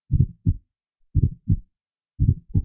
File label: S4 Heard In Stiff Ventricle